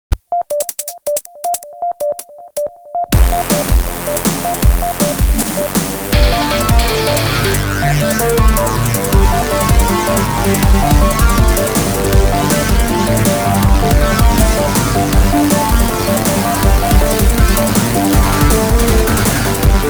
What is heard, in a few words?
Bells; Vector 2; Android